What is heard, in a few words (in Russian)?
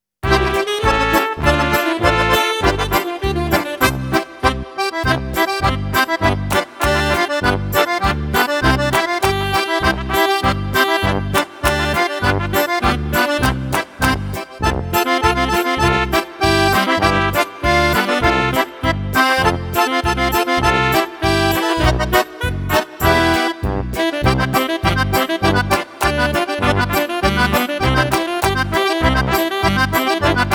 Музыка к Игра руками